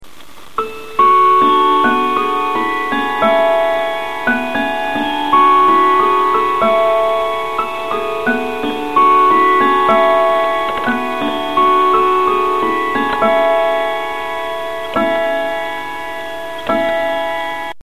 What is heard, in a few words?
wittington; simplywallclocks